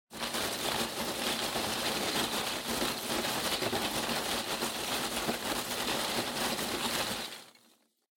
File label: Loose Fill Packaging, Rustle; 梱包材; バラ緩衝材